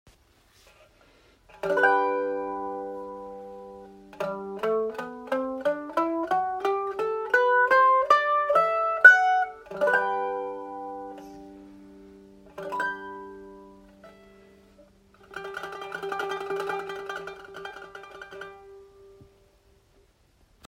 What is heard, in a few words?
mandoline; solisten-mandoline